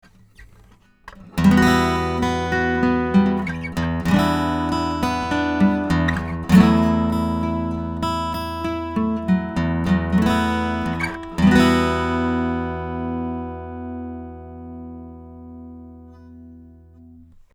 Steelstrg Blend; Rupert Neve Designs Portico 5017

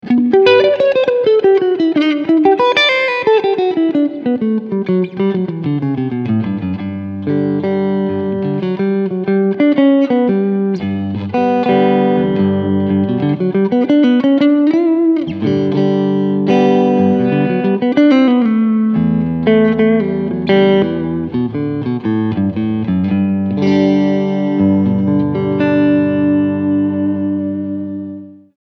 Cleansound P90

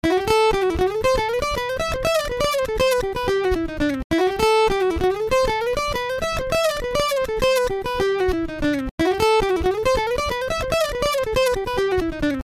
Acoustic Guitar

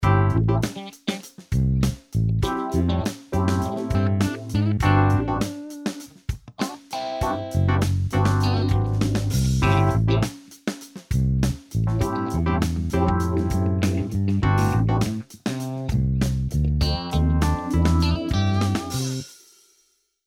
track dry unmixed; Presonus SL3

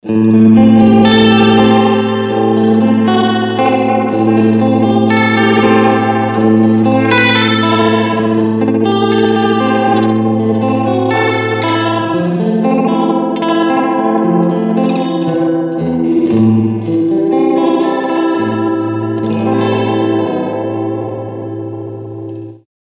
Soundscape

